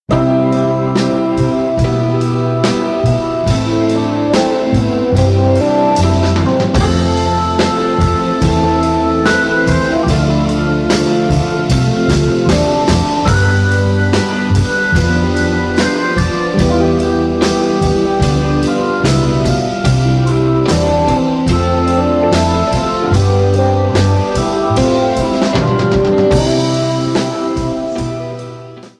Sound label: RING